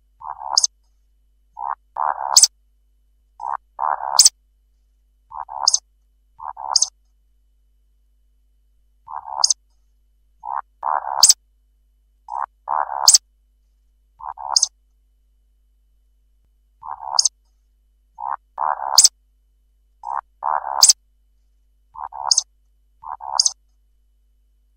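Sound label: woodcock decoy call; validshnep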